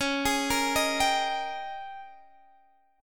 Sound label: Ab Major 7th Suspended 2nd Suspended 4th Db, Guitar